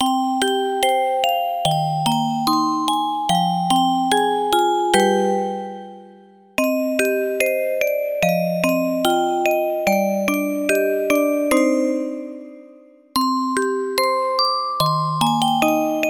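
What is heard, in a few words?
hinamatsuri music box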